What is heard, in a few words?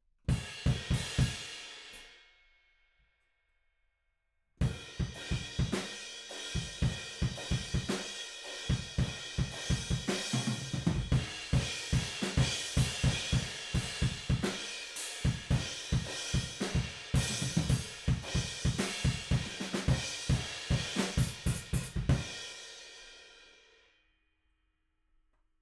Room